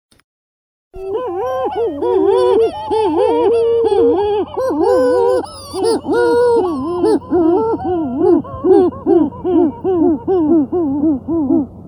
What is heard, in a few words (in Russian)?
burevestnik bolshoi pestrobruhii puffinus gravis